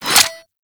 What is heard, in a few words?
Hack Minigame Transfer; Entrati